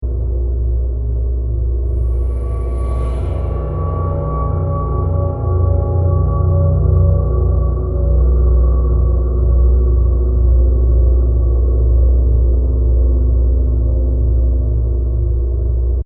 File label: Dark Ambience With A Bright Rising Signal 120bpm; Scary Soundscapes; CraftedSignals